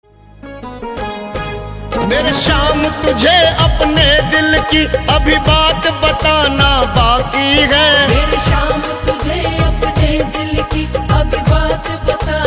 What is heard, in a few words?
Khatu Shyam Bhajan